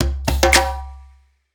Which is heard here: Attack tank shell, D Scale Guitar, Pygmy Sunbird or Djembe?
Djembe